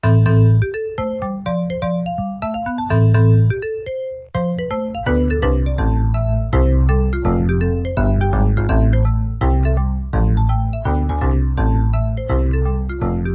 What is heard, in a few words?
Game Music; qbic amiga